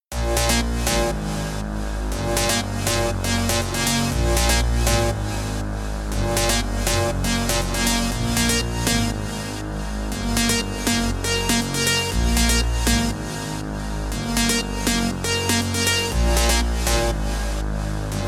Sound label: EDM Melody 120 BPM